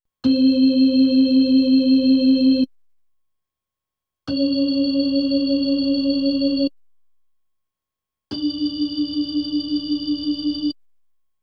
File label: B3 Organ; Masterbits CLIMAX 8